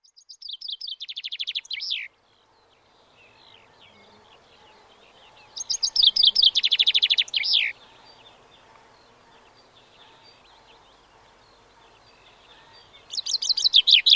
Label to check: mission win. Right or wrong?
Wrong